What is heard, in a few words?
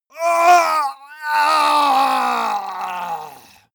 male die gurgling; soundpool